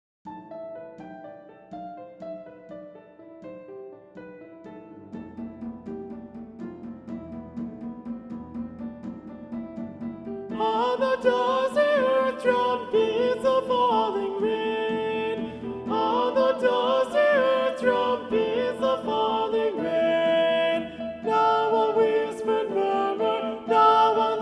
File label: Rain Music Alto Predominant